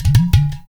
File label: UDU; Spectrasonics; Heart of Africa